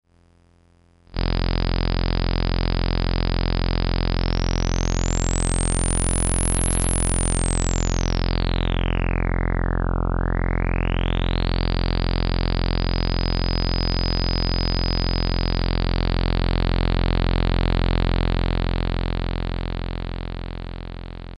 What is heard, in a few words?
VCF, Minisonic2 Analogue Sound Synthesizer; G.D.Shaw's Minisonic 2 synthesizer